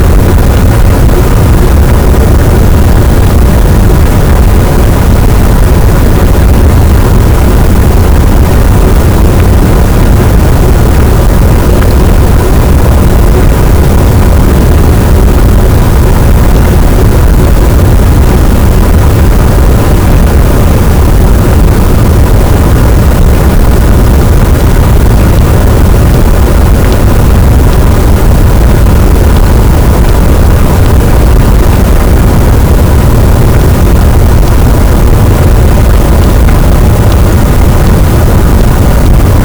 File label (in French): cachalot, Physeter Macrocephalus, gaussian noise